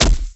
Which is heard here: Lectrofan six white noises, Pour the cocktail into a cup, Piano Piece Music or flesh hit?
flesh hit